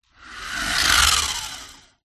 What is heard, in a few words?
leisure radio controlled car pass; zapsplat